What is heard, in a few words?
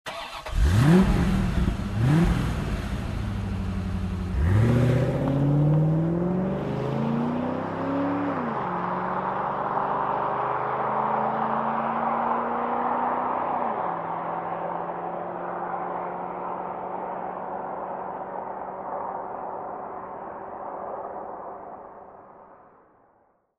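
car corvette ignition and fast start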